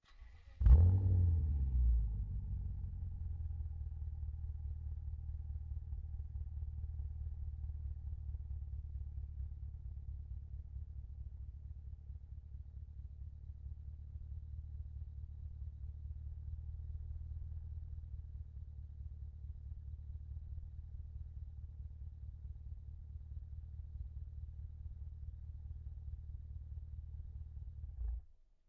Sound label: bmw x5 m exhaust start idle stop